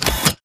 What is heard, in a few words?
Mvmt Servo Head Extend; AI Stalker; Titanfall 2